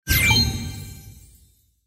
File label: payline Yellow; Crystal Furnace